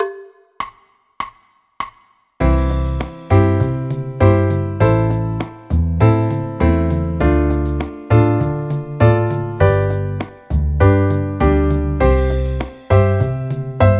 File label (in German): c dur akkordverbindungen